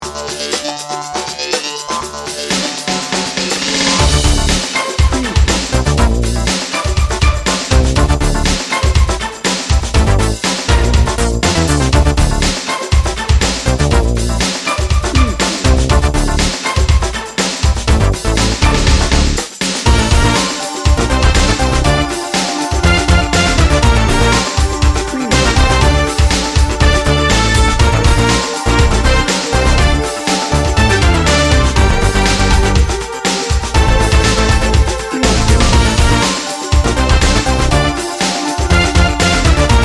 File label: 90s diva 60 second